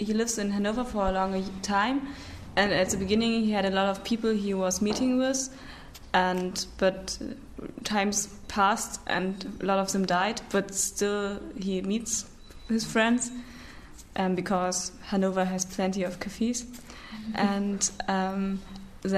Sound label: Vuvu